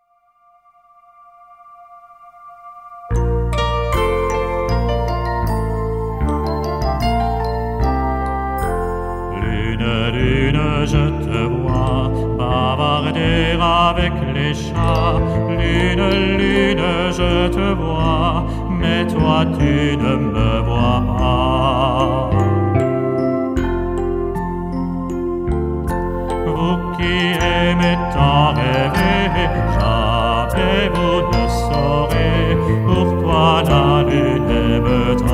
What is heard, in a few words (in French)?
Pour la lune - 3ème voix seule; Ecoles Qui Chantent 1999-2000